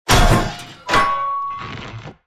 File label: drop anvil